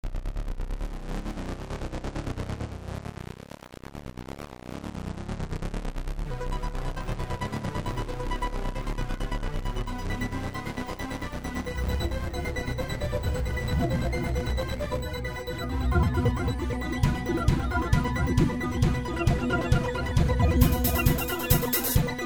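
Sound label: square arpeggio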